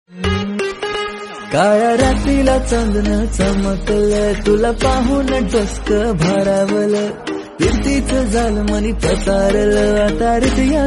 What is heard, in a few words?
Marathi Song